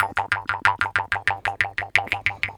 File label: KHOMUS